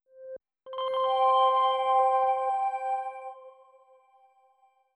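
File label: granular bell accent C major; bespoke - Floral v.2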